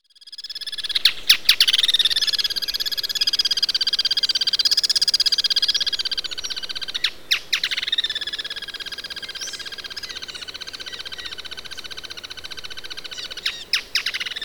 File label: Striated softtail